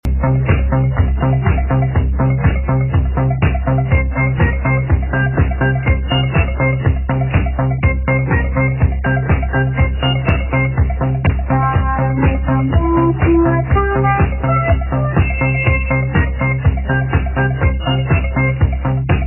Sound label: funky taunt pop